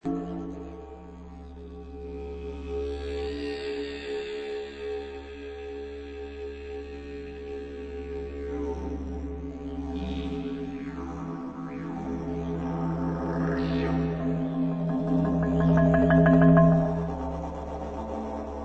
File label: heat drum together, percussion